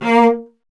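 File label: strings cello A#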